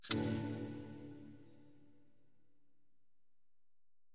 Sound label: spin Button Quick Stop; fairyhansel